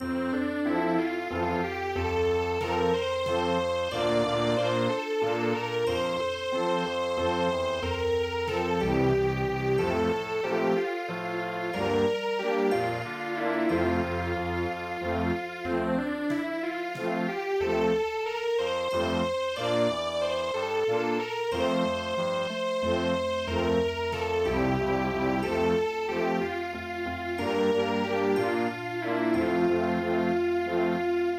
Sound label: Vienna Waltz